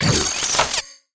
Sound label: ice Sound; EggHeads